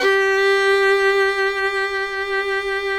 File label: FIDDLE NOTES, G4, R